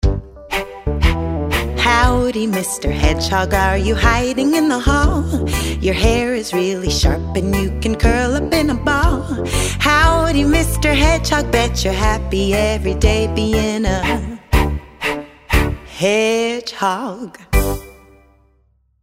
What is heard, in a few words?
H Song Hedgehog; kindergarten grade 1 2 letter sound